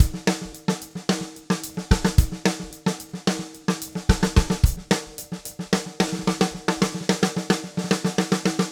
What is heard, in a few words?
Black Maple Groove 110, DW Black Maple Kit, 110 BPM, Drums; Sonic Foundry (Sony Creative Software); Vital Drums